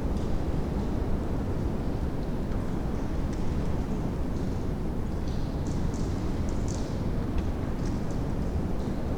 industrial ambi; factory; soundscapes

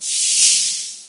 chatter, wraith; doom3

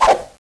knife shoot; cso; tknife

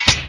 impact on body

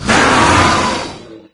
attack solo, wraith; doom3

